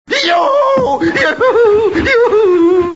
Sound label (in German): juhu